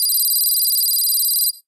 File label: Open reward